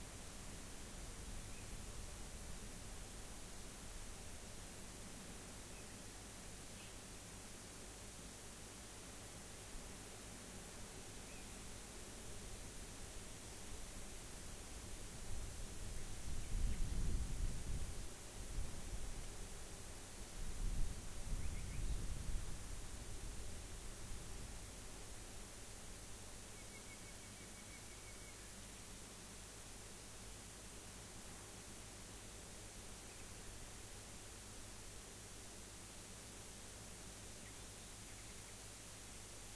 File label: rayol farm; SEAMED